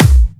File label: Clubby Kicks, Bassdrums